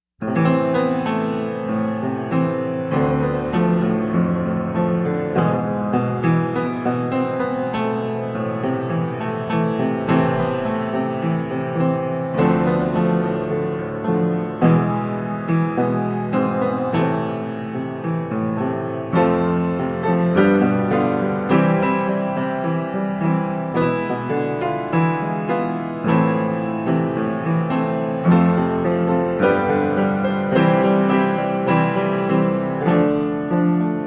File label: Haunting Melody